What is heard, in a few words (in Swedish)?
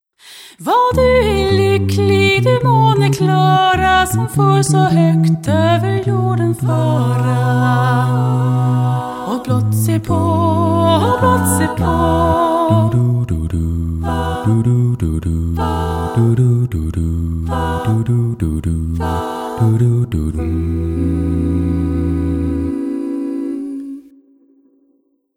musik